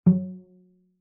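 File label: Cello pizz fortissimo sul C F3; MIS Pitches - 2014; Strings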